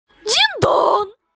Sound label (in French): dindon ringtone